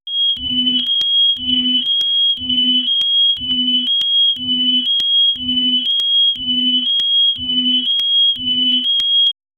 futuristic sci-fi alarm

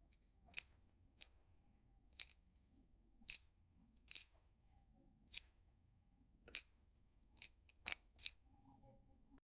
diamond blings, jewelry

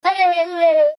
bells

